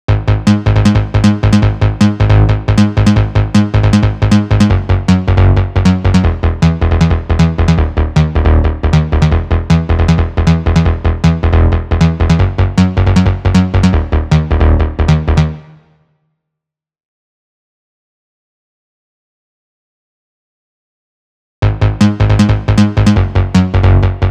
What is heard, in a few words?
MUS LASTBOSS LOOP bass; rbx lastboss